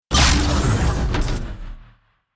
Robot punch impact; robotic; gfxsounds

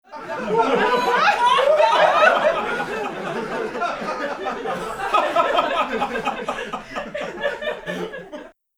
Audience laughing loudly; Crowd

